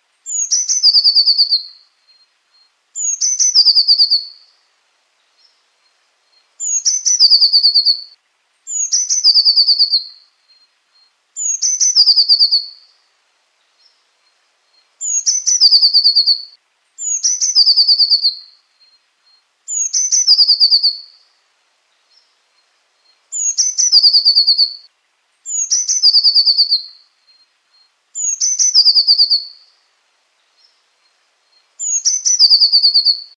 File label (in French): bleue, mesanges; mesanges dans mon refuge LPO